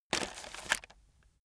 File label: chocolates take hard